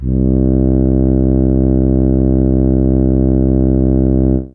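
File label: Slow Trumpet C3, Fairlight CMI IIe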